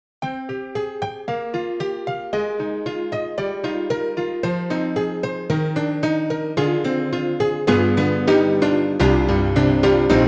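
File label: old honky tonk piano; EDIROL; Super Quartet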